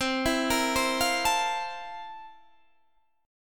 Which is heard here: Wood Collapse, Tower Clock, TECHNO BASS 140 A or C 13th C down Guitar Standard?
C 13th C down Guitar Standard